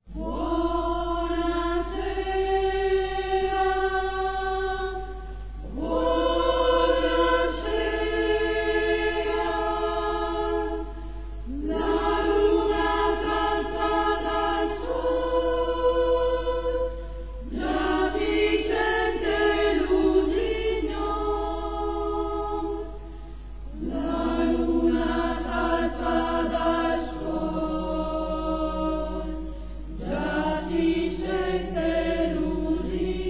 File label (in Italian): Buonasera voci femminili; CoroLaTraccia